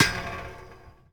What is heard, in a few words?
Street Ball Metal Back, Street Basketball